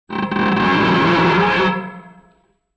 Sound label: metal, gnissel